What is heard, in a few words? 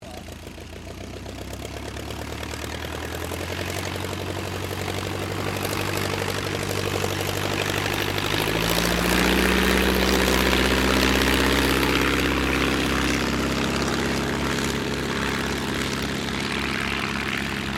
AIRCRAFT DH82A DEHAVILAND TIGERMOTH TAKEOFF; 1940S; Mixed selection of Tigermoths from the 1940s; Airplanes